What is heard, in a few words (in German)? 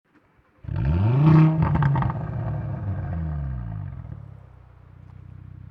AGA zu Launch Control, Abgasanlage Audi RS 3 Limousine 8Y; stoll sport